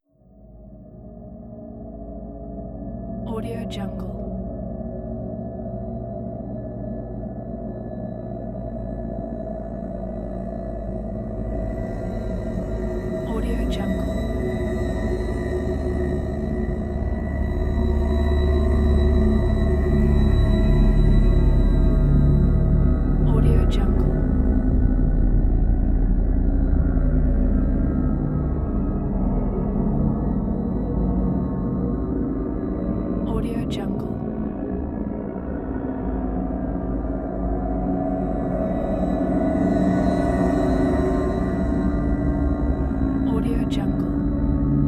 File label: frightening mystical drone